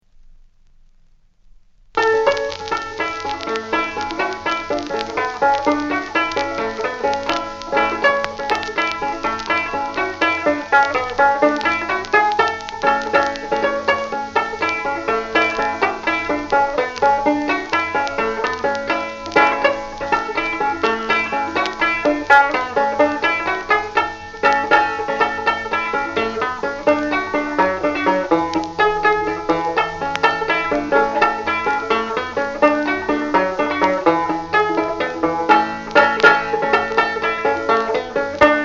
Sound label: Banjo solo